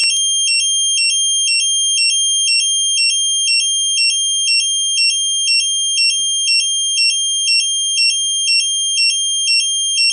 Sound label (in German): Vollalarm